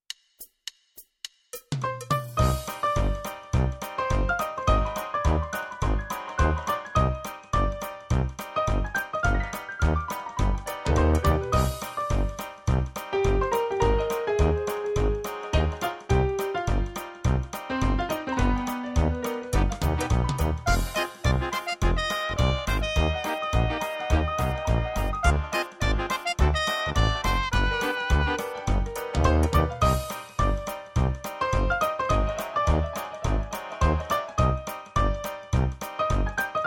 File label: Ragtime; musica di scena